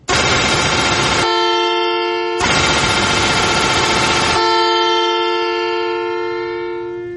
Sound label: Pakistani bus horn